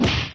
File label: punch hurt; sfx